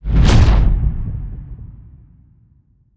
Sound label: Whoosh Epic Sonic Punch